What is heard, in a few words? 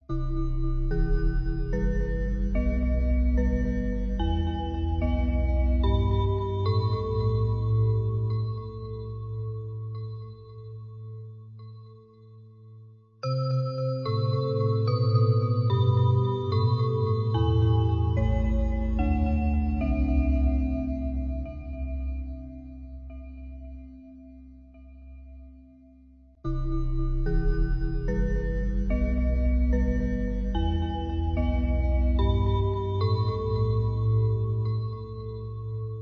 slow